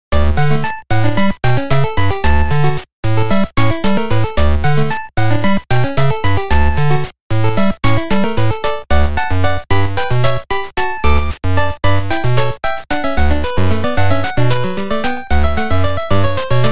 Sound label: Helmet Area